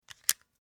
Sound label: Pager Open Battery Compartment; Technology; Telephones; Electronic